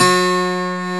12 String D3, guitar

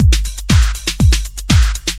House

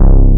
Bass

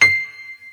piano

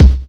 kick

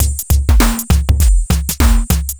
elec

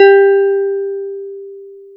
DX9 Guitar; DX9Guitar2

